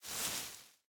bush big, walking, plant; Factorio

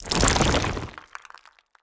worm standup, creatures

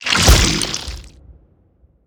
spitter death behemoth, creatures

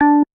bass, programmable speaker

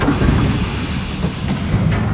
centrifuge; Factorio

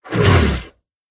worm roar short

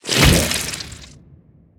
spitter death big, creatures

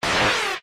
robot die vox; fight

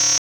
buzzer, programmable speaker

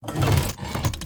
splitter; splitters

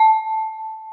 celesta, programmable speaker

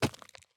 stones, walking, pebble; Factorio

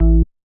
bass, programmable speaker

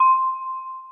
celesta, programmable speaker

